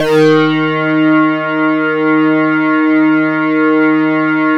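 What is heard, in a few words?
OB8 full blow, OBERHEIM OB8; Big Fish Audio; First Call Vintage Keyboards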